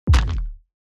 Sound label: room damage kick